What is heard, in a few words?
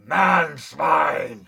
raargh, goblin; enemies; Dungeons Of Kharadum